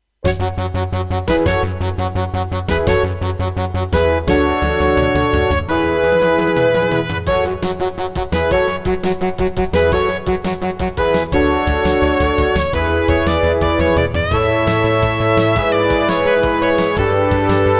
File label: Intro, MP32L CM32L ROM; Serdaco; 4D Sports Boxing